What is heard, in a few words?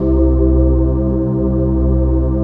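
chord pad, Pads; Best Service; XX Large - Pads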